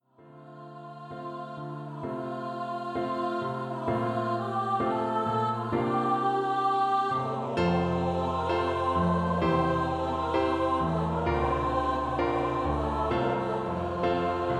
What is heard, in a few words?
2 part song for SATB